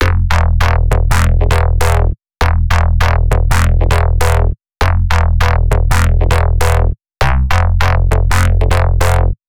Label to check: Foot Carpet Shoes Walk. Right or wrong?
Wrong